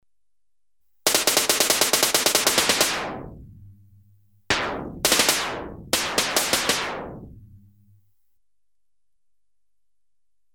Compressed gas gun (mixed bursts & single shots), Electronically Generated Sounds; Sound Effects Library